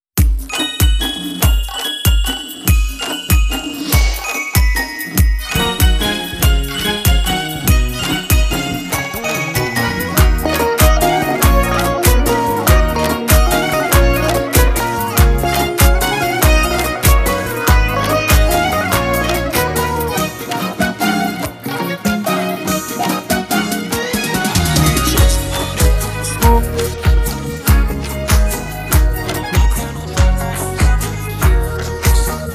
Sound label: Karaoke